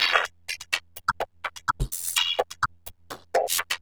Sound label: Granular, LOOP 125 BPM; Bolder Sounds - Granular